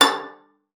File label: PIZZ, VIOLIN; Xsample VOL-5; 90 s Sample CDs; VIOLIN FING